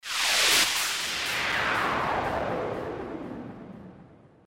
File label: WIND SWEEP, SWEEPER; RADIO STAR